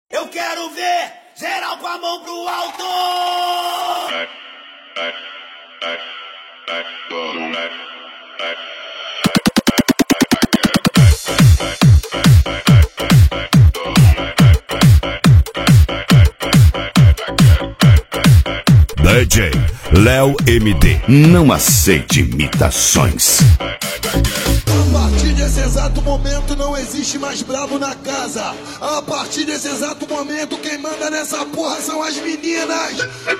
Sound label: Bass